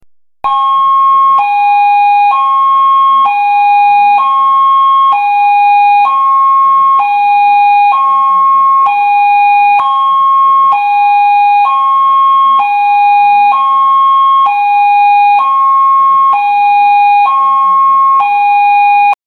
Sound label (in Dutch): evacuatie